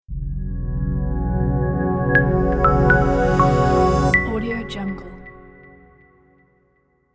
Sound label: soft atmospheric evolving logo reveal; becomestardust